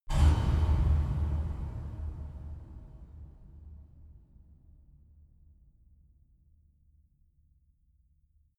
reverb metal soft large

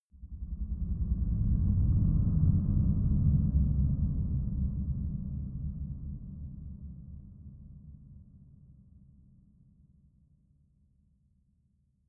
low airy rumble, Drones; musikelemente

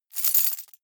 key ring large rattle air hard; objekte; schluessel

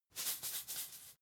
salt shake rough

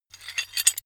metal handling pieces

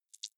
click noise, signale

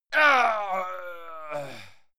male fight pain; soundpool

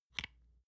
lotion large lid close soft; berufe; friseur

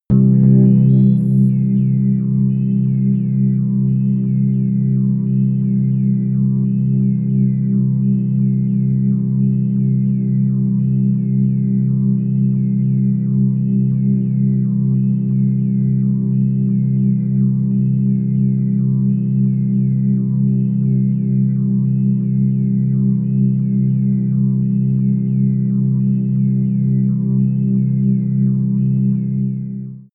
soft organ chrips; flaechen

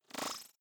sci-fi expand flutter; sweeps-swells

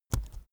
keyboard laptop enter press slow soft; kommunikation; computer

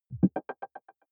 button echo falling; maschinen; signale; soundpool

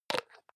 hygiene small cup close medium; haus-garten; badezimmer

